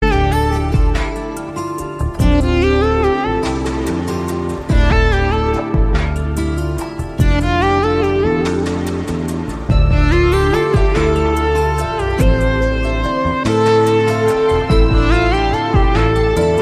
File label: instrumental ringtone, bollywood ringtones